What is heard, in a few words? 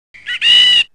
wrong; Beyond Atlantis Malcolm